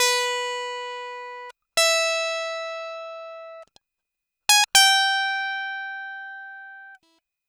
fanfare

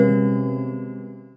chord

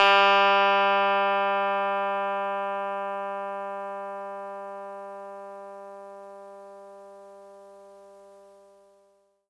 guitar g

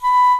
Flute B5

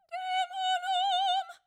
choir

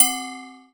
tamtam

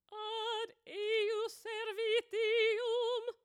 choir

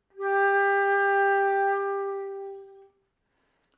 flute G4 mono

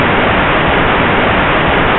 triangle noise

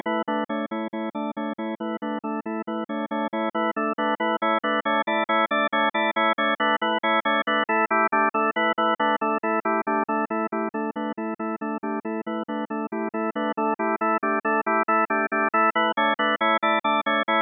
organ

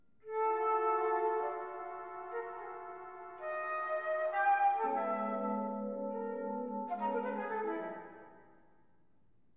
jupiter record rhythm

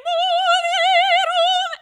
choir

